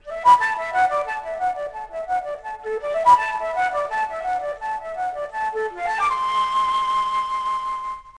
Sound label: FLUTE